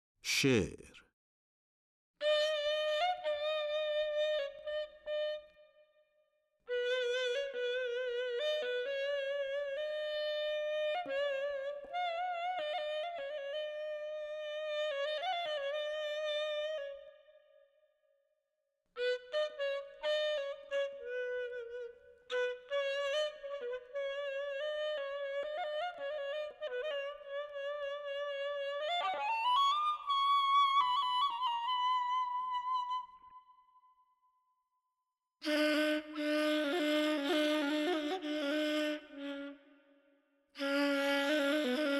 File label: She-r Daramad Homayun Radif-e Ney; Dastgah Homayun